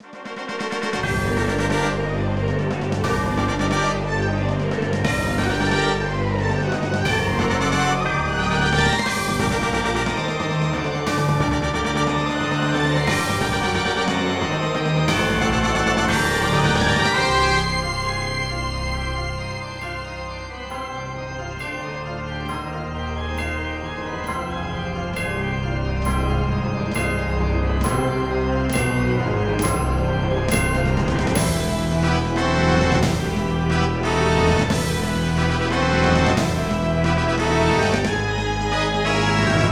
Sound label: Battle Theme; stonekeep; ms dos; Fluid R3